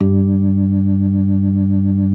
B3 G 3; B3 LES

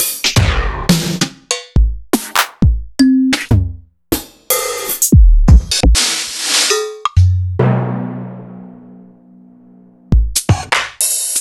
EMU Proteus-2000; m8

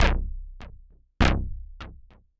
synth 100 brutal C; Transmission-X

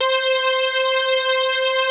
POLY SYNTH; Wizoo - Powered Wave

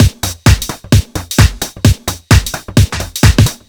Drums, 130bpm, Nu Dance Electro; Zero-G; Soundclash; Combi Loops

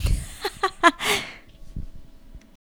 Vocal, Infinite Soul

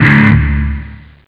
guitar 4th; jazz jackrabbit 2

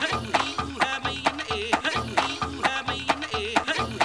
HOO HE HO, ETHNIC; AMG; Global Trance Mission VOL-2

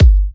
G, Kicks; markus hakala

